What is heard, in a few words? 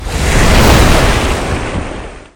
attack water; effect